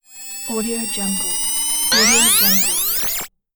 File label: retro machine power on